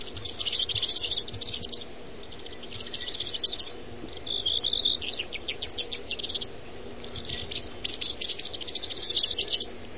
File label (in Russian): spokojnoe shebetanie lesnih vorobev